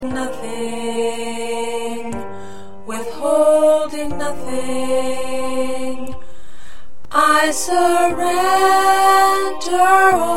Alto; practice tracks

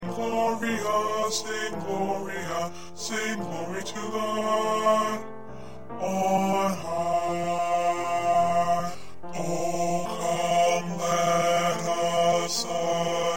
Bass

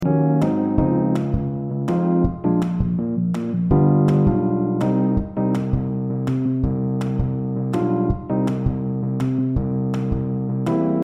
Instrumental

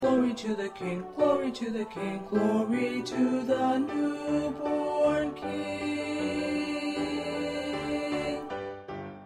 1st Baritone

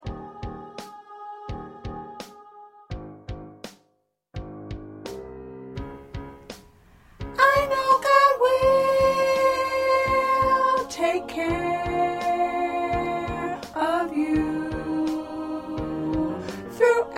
Soprano

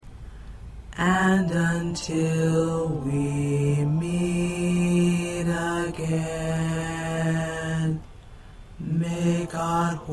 Bass

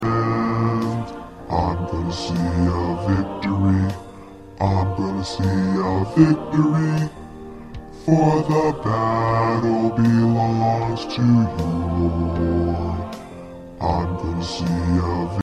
Bass

